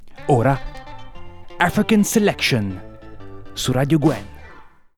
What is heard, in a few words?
Jingle African Selection